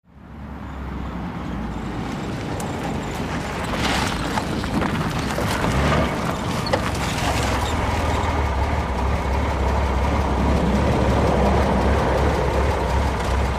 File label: download Sound, Chevrolet Corvair, In On Gravel Medium Fast, Idle, Set Parking Brake, Off.